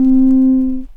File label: Square Soft Vinyl Synths C3; vinyl keys